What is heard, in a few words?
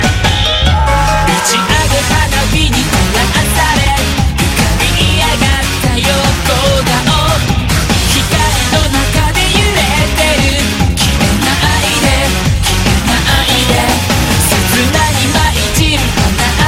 Funk